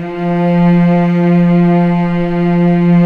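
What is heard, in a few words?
cellos F3, String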